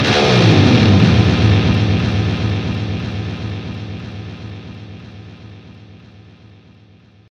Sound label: Double guitar dive